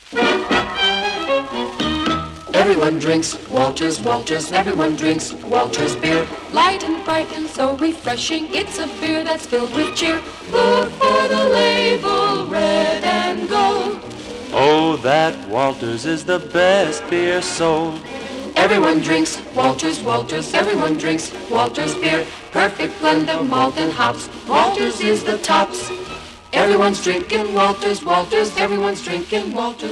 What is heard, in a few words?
Jingle